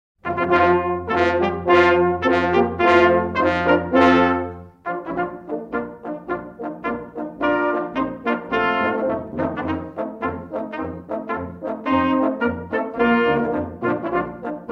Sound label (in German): Feldschrittmarsch